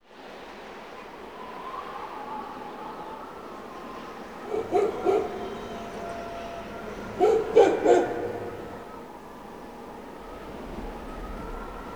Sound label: Ambient BGM